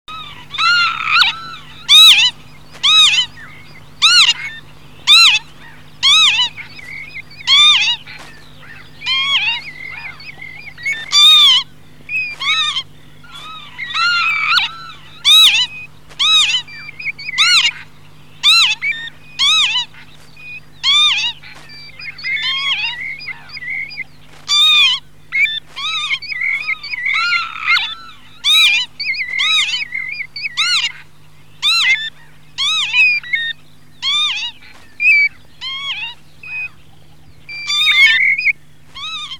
PAVONCELLA PIVIERE; CANTI SAG NATURE 2024

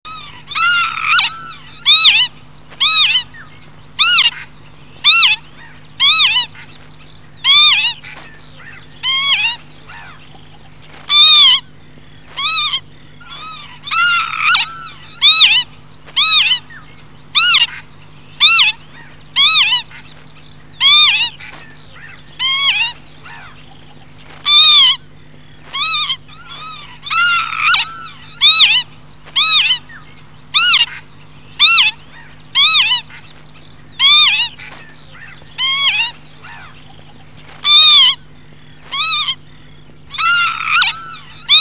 PAVONCELLA; CANTI SAG NATURE 2024

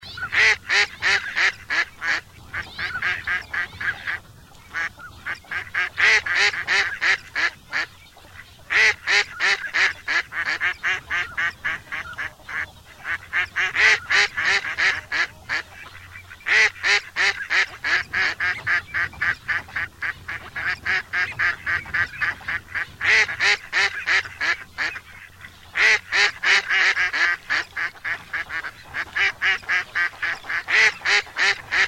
GERMANO; CANTI SAG NATURE 2024

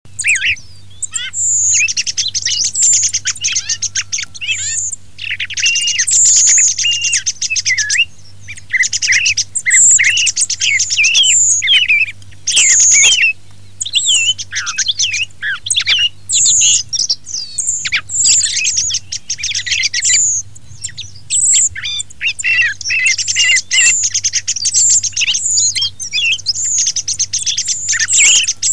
TORDO; CANTI SAG NATURE 2024

